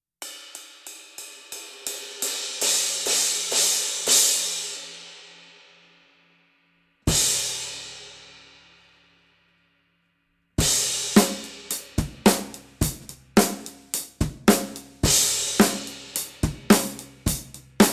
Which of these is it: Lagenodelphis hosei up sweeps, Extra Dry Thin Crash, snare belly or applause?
Extra Dry Thin Crash